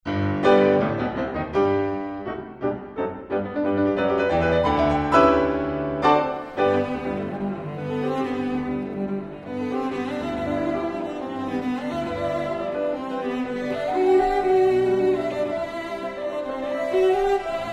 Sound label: Sonata for Cello and Piano; S. Rachmaninov